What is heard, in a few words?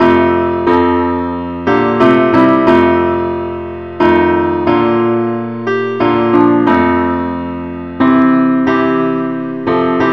parts only, key F